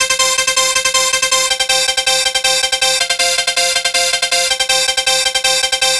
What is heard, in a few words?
Dance Synths, Hardcore Loops, Synth Loops; Best Service ProSamples vol.37